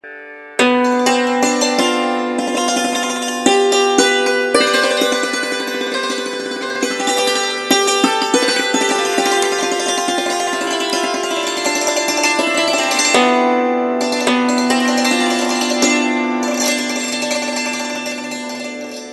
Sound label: Sitar; Swaminarayan Ringtone